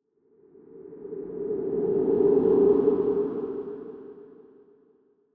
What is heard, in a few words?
Ghost Wind Swell; MinorHallutinationsSounds1